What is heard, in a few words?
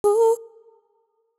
vox (ooh); AngelicVibes; Waves